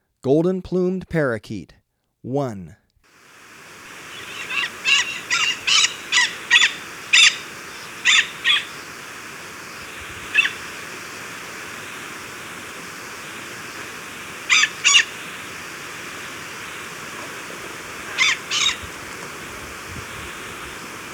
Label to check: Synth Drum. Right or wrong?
Wrong